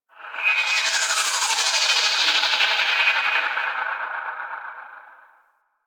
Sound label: Gas City Distant Textures; Warframe; Corpus Gas City